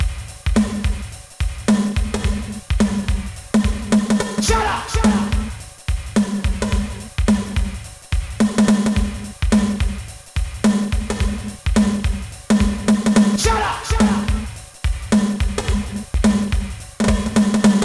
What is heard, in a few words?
intro drums; Trackers; Modland; intro drums 2